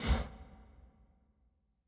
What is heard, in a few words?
ship Int Door Close